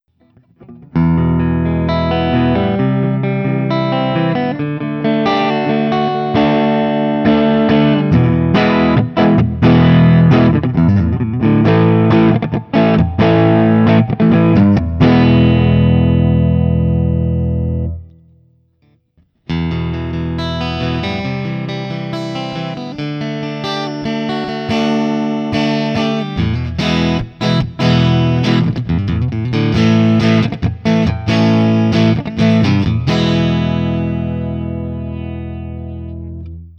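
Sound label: Test Riff, guitar; Guild CR01 ODS100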